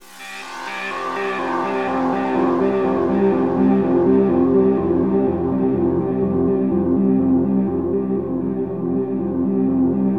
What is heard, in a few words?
Access Virus B; Synthworx